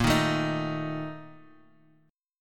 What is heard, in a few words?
A sharp Major 7th Suspended 4th; strummed